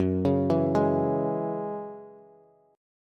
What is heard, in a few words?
Gb 7th Suspended 2nd Gb Bass, Gb7sus2 Chord; arpeggiated; JGuitar